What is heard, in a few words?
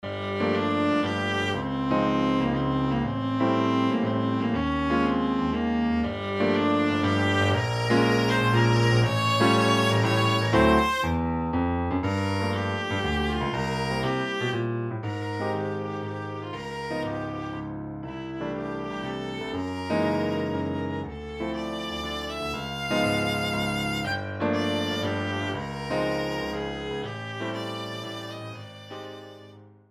Viola Piano